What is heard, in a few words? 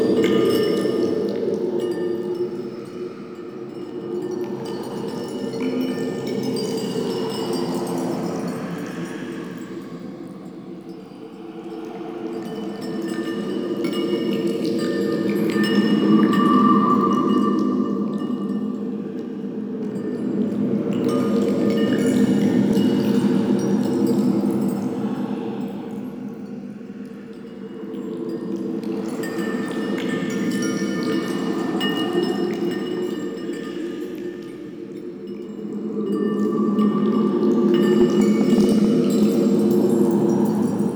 Large Bed Amb, Colony; Warframe; Ambience; Nokko Colony